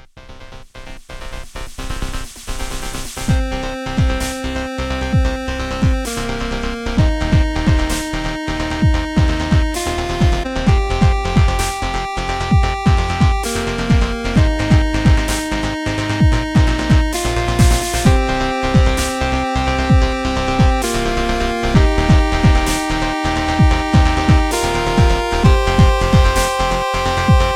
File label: menu; air wolves